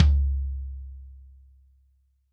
Tom Low Gen Purpose, Drums

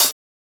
Hihat Closed

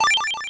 Close Custom; MMBNMMOP

